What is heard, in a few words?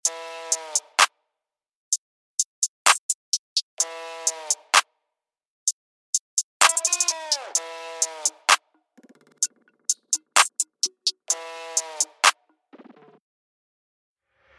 Trap